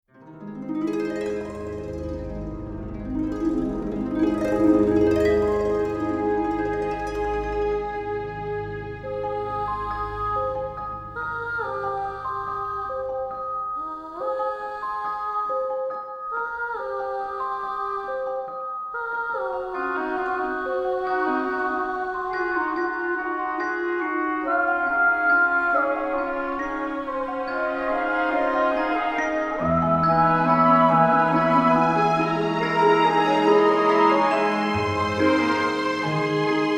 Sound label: Orchestral, Music